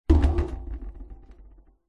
household plastic empty bucket set down into metal sink; zapsplat